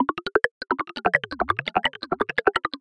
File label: Abstract Loops; Cyclick Samples; Kit One